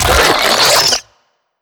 pre explo; tf; slender; attack of the radioactive thing; minion

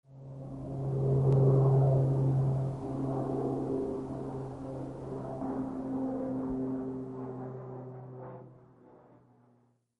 prop, airstrike; dod

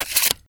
shotgun reload; weapons; hl2dm

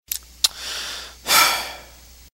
sigh

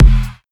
boombox jump; pets

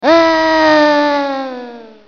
argh; Oddworld

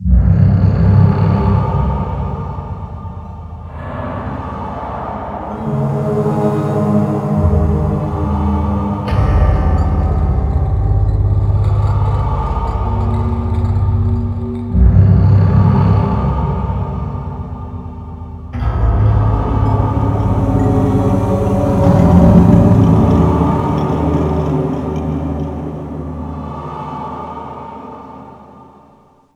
alert theme; tf; slender; buffy

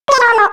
death; isabelle

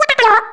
cheers; isabelle

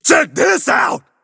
grooves positive; tf; a hat in time

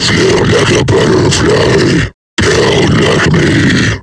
alert; kfsmr flesh clown

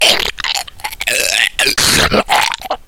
death, slender shiver

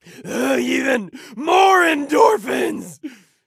stabbed; freak fortress 2; krieg

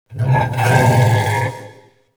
wretch tunnels amb alert; A Machine for Pigs

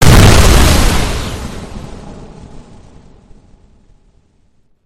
mortar explode; hl2dm

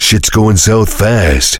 heavy singing; tf; duke

